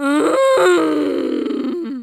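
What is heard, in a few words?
female zombie; Project Zomboid v41.73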